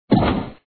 bomb Explosion Sound; nexon

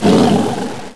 Growl; Tigerzord; Mighty Morphin Power Rangers Screensaver

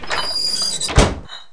FRIDGE; REDNECK; RAMPAGER

